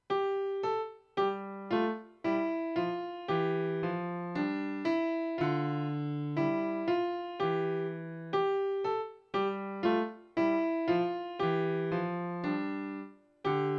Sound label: London Bridge canon